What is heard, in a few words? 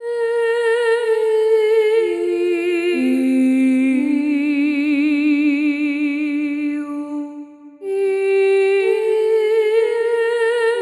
vox bar 123 142 bpm, vocals; terranigma